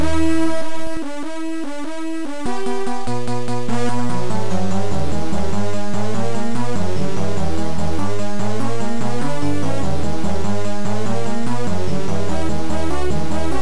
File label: Blues; Dr Yorick